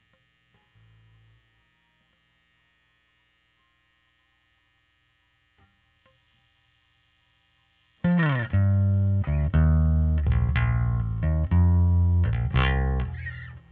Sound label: Bass OD